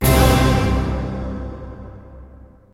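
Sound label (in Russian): оркестр торжественный аккорд с трубами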